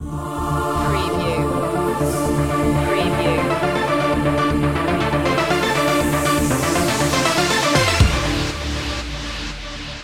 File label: arp stinger